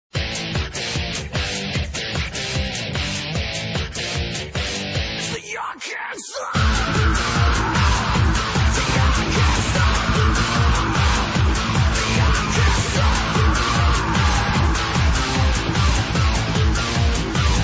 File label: salsa, dance